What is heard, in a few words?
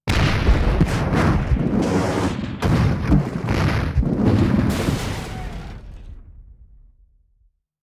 Elevator Explo; Dungeon of the Endless; EndGame Elevator Explo